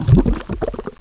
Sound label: lava enter